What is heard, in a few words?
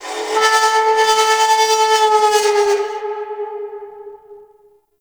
Asteroid Metal Groans And Scrapes; Ambience; Warframe; Grineer